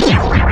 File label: Korg, FX; Back In Time Records; Korg Universe VOL-1; FX FAMILY